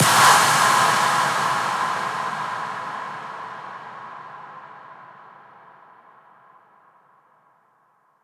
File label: FX Downlifter